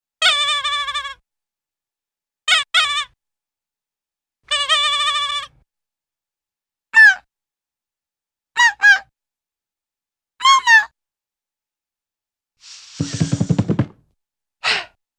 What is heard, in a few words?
Toy Doll Cries Squeaky Sounding 'ma-ma's' - Inhale; Cartoon; Hollywood Edge; Cartoon Trax